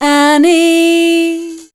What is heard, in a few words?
voice spectral, ly short